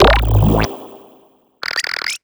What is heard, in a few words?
cube success